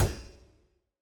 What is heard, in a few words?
ball bounce; battle; CrossCode